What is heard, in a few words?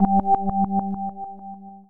fx synth